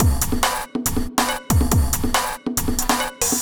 Beat Full, 140bpm, Grime; Zero-G Sound Sense Grime Scene; Construction Kits; Astoria

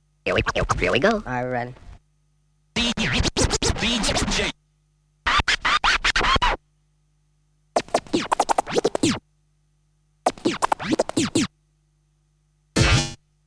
Scratches; Kniteforce; 1000 Samples Volume 1

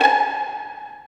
STR VLN PI, String Vlns Pizz; Roland - String Master Series; Vls Pizz

